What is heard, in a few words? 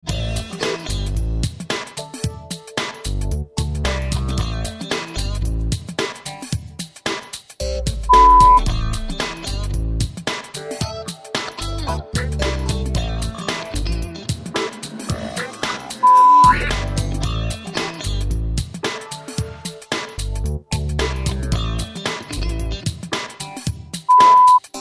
Groove